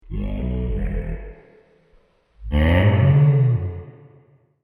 Fantasy Pack Animals, monster sound effect; Andrew Fleming

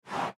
Horse Breathe Out; Nose; Animal

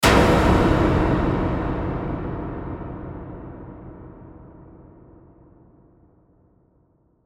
Hit Metal Impact Reverb; Metallic

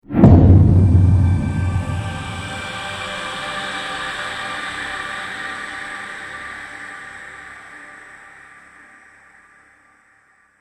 Trailer Hit Evil Energy; Trailer Sound Effects

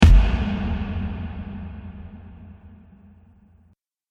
Cinematic Drum Strike Bold, low impact

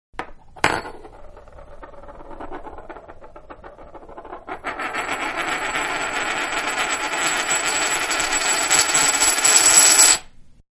Half Dollar Spun On Counter, Coin; Money; Objects; Manhattan Production Music

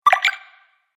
Fantasy Game Collect Sound, video game; Twistedtracks

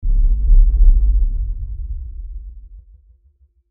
SciFi Wobbling Impact; Low Impacts; Sound Effects:Impacts; CraftedSignals

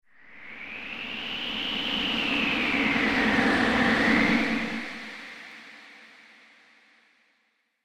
Graveyard Wind; Scary Soundscape Sound Effects

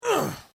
Pain Umph, Male Painful Grunt, Hurt; human sound effects

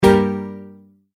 multimedia tone exclamation; McKinney Sound

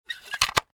Cassette Case Close; Technology; Tape Players; Electronics

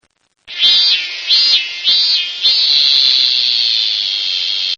CICADA; Insects; Sound Effects:Animals; Partners In Rhyme

